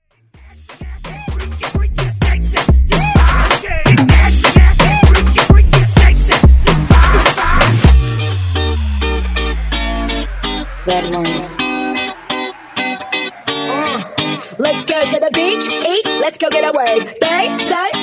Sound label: Afro Hype